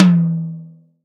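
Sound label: Acoustic Tom; Roland SPD-S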